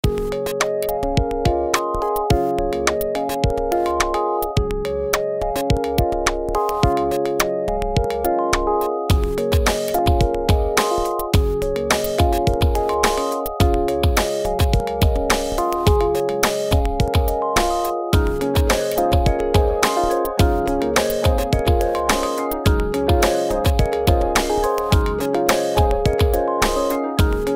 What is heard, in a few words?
Melodie; Dive Into PixelJunk Monsters